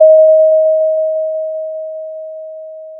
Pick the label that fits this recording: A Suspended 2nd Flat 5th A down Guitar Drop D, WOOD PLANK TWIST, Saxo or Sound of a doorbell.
Sound of a doorbell